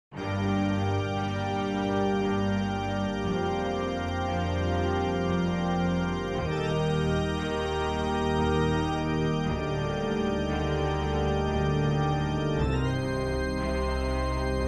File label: adagio in g minor for strings and organ; tomaso albinoni; remo giazotto; syntheway strings